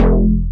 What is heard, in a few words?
bounce; quake2; chaos